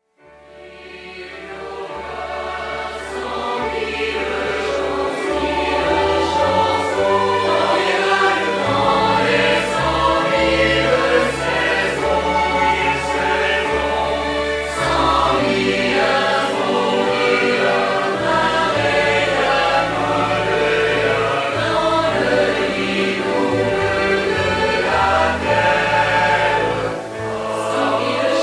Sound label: SATB